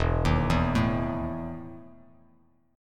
E Major 7th Suspended 4th Bass Standard; EM7sus4 arpeggiated

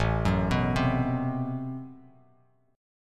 A Minor 6th Add 9th A Bass Standard, Chord; Am6add9 arpeggiated; 5,9,9,5; JGuitar

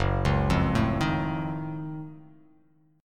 Gb Minor Major 13th, GbmM13 chord; arpeggiated; JGuitar

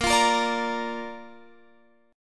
A sharp Major, Guitar, Open F; strummed; JGuitar

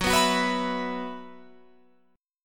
Gb 7th Suspended 4th, Guitar Open F; Gb7sus4 strummed

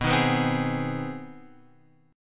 C Minor Major 7th Sharp 5th B, chord

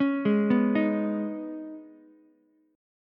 C sharp 7th Suspended 2nd, C sharp, down, Ukulele; arpeggiated; chord

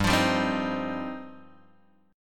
G 7th Suspended 4th Sharp 5th G, Guitar Open G

